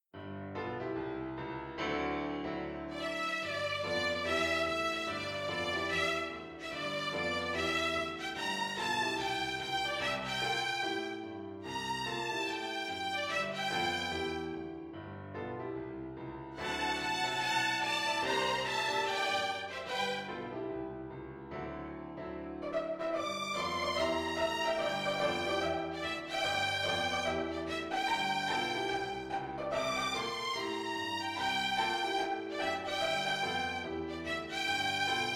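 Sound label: Violin duet with piano accompaniment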